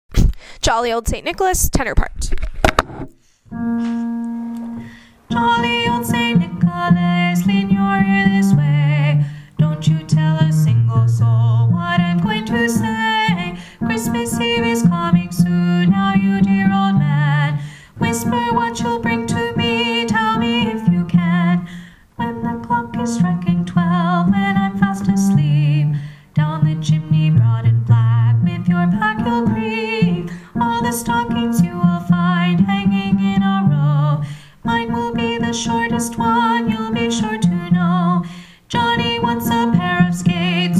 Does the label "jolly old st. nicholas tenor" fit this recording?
Yes